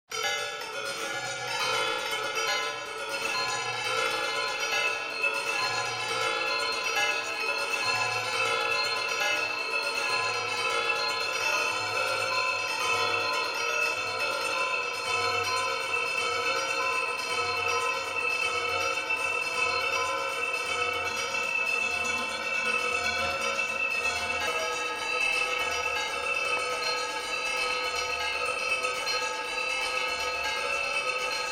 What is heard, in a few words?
Four Hawkbells